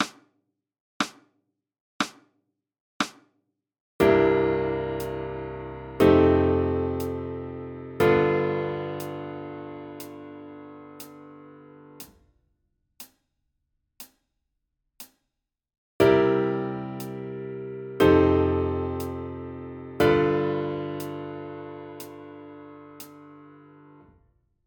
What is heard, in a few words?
Blues Voicing